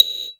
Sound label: HI CLAVE GT